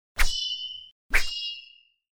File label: Attack; Lust Princess